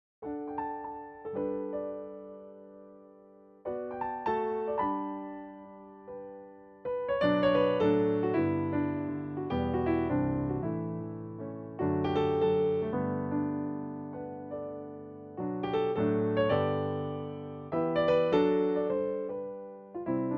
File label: Piano Solo